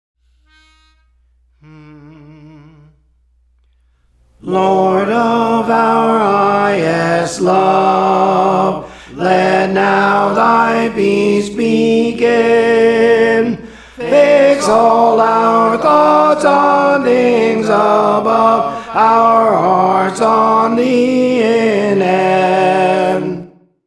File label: Tenor, Songs; Praise For The Lord Training